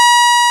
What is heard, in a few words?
win; YACPong